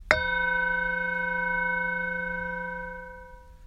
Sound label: bell short